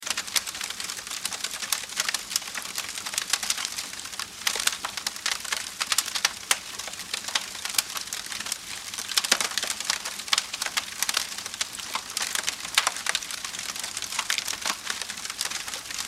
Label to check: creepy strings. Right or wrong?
Wrong